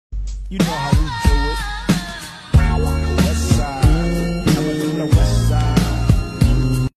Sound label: indian funny dance; audio meme